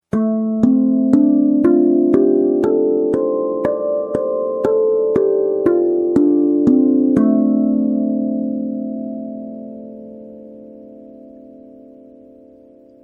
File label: celtic D